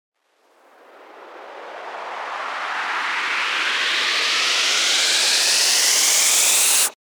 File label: RISER